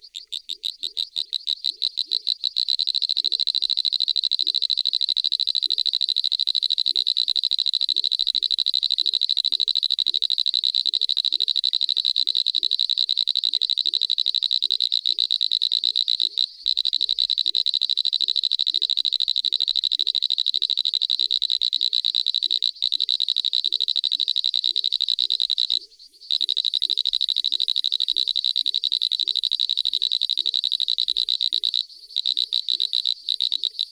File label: Pseudopaludicola ternetzi